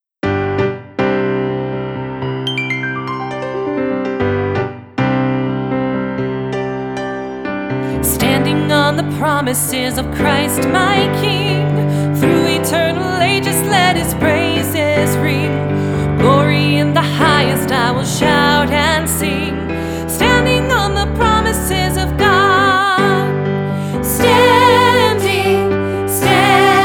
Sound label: piano